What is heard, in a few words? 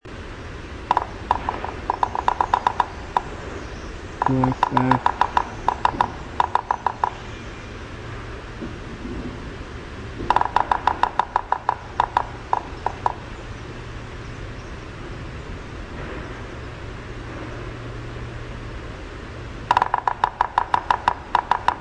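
Red-breasted Sapsucker drumming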